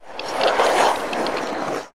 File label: SPELLS Freeze